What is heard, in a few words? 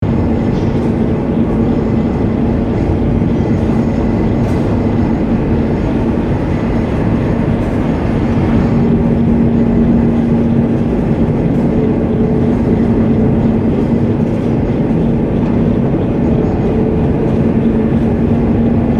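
Ambience Descend General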